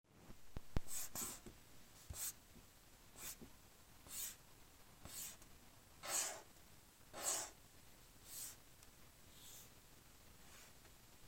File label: Swoosh; ludum dare 46